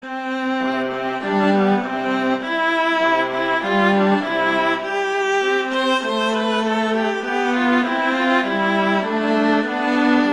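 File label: Duet, horn; Mozart; Duets; Vla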